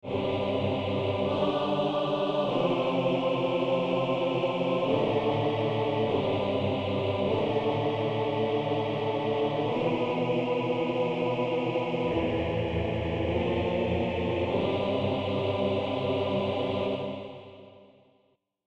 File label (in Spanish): Sin tritono